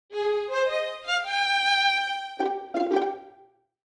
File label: Mobile game strings level up